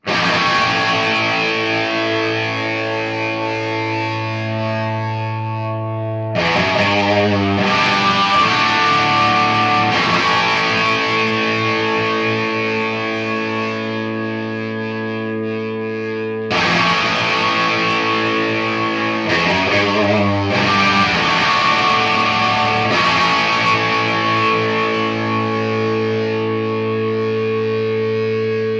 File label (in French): Heritage H535 et Marshall Superlead, ampli guitare